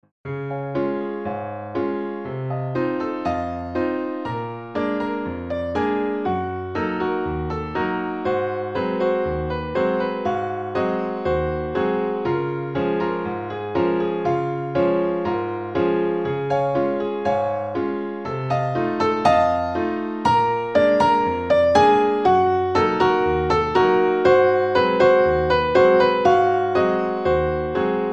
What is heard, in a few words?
bgm